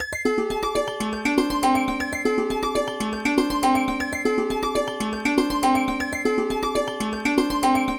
santoor loop arabic tuning

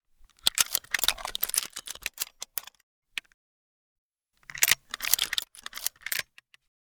Gun Foley

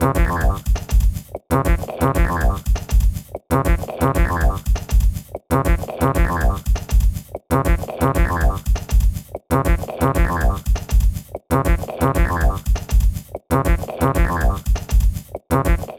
Modular; sampling instrument; Rando by Monkeyc; Atlas

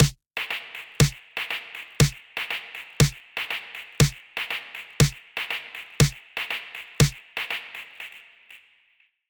Snare, Filtered Perc Dirt, feedback echo; Newfangled Audio

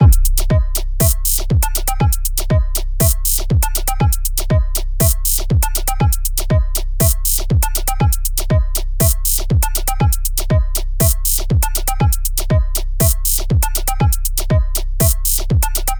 Electronic Drum loop wet

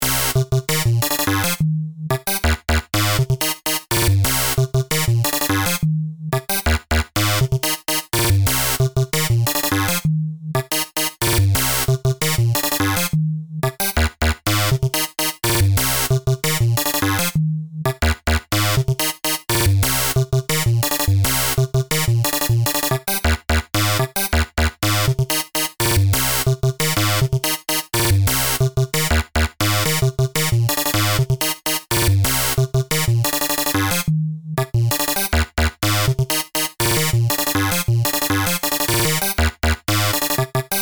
random jump, sequencer; both with and without drums; Numerology; Five12

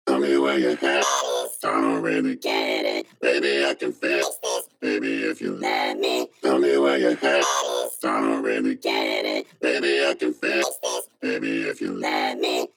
alienize; manipulator

